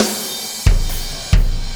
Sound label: Impact Crater Beat, Processed Drumkits